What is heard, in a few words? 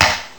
HC-2 Clap; Boss HC-2